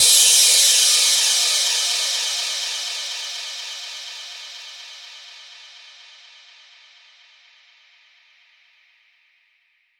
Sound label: Crash, Cymbals; Vengeance Samples